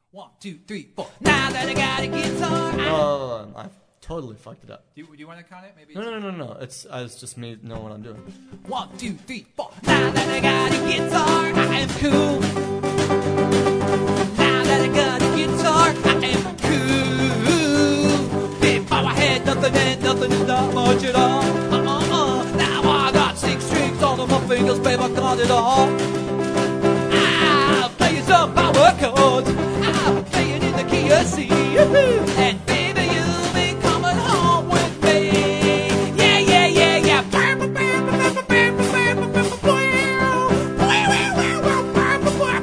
guitar

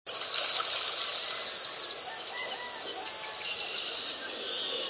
merganser hooded fleeing; birdsongs

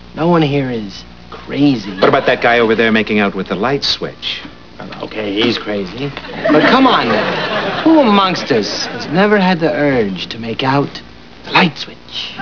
lightswitch

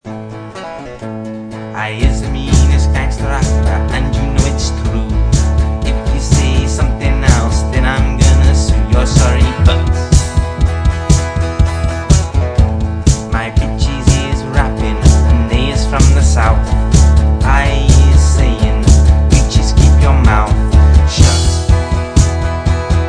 Ghetto Rap Blaster

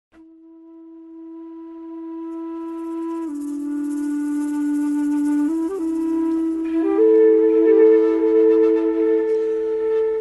flute sampler

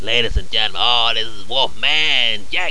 wolfman

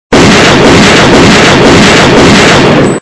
shotgun

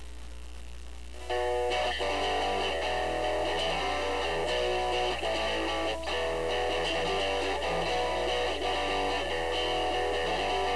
inside out scratch

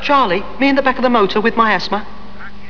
asthma; ItalianJob

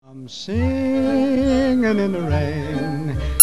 singin

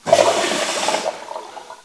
urinal; casa; banheiro

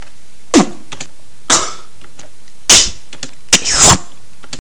snares

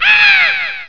Witch

